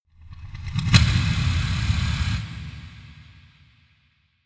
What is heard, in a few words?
hit spinner deep, whooshes